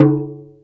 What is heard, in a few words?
MALAY DRUMS; Spectrasonics - Heart of Asia CD2